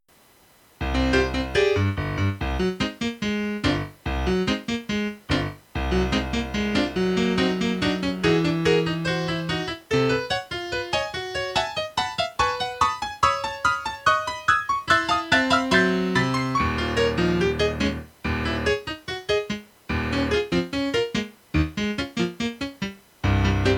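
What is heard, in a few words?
accompaniment only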